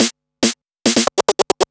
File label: Scratch Fills 140BPM